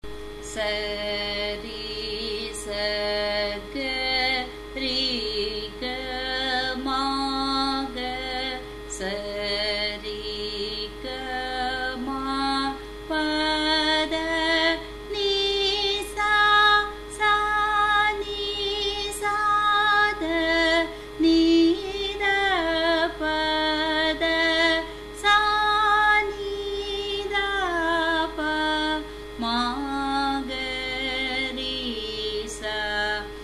Dhatu Varise, G sharp

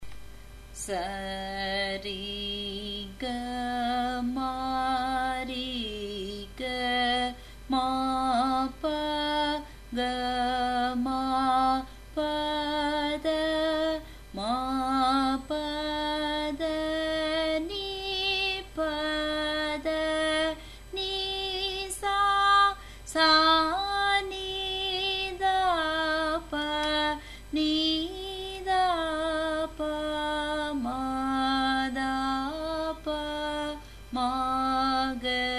Eka Talam, G

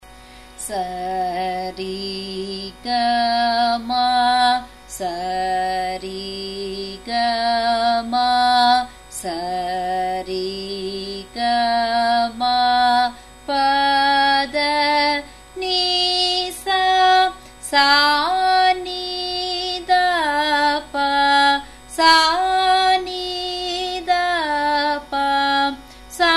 Sarali Varise, F sharp

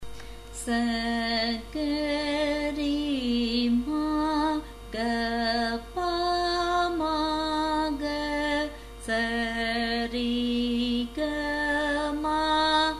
Dhatu Varise, A sharp

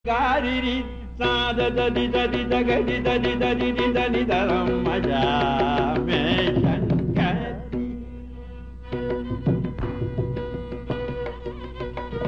varali swarakalpana; manodharma; mamava meenakshi

